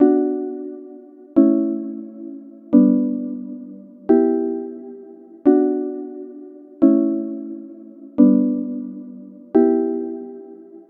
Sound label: melodic loop keys E min